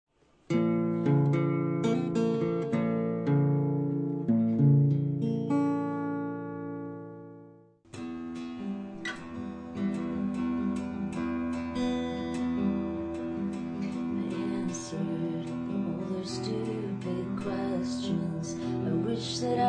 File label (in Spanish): Acústico